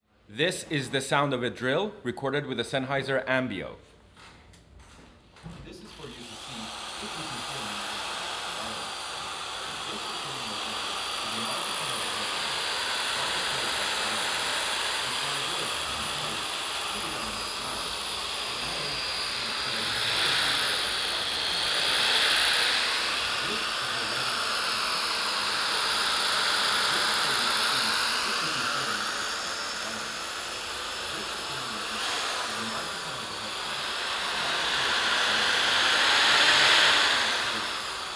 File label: binaural drill; sennheiser ambeo